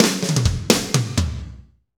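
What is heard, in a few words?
slow shuffle, Roland drum beats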